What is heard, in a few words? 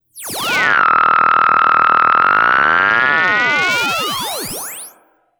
FX, EMS VCS3